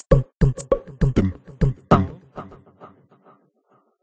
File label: Jungle Ambience Beat Short; And Yet It Moves